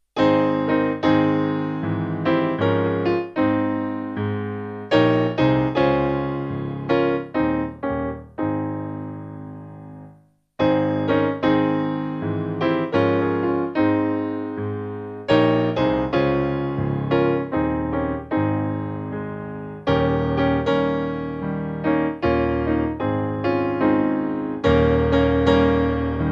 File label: Jesus Saviour Pilot Piano; Gould; hymnary